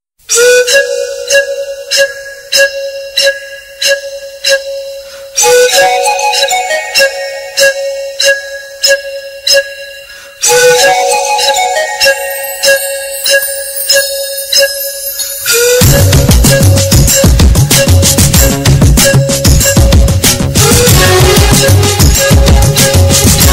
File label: New Flute Ringtone for Mobile; ringtones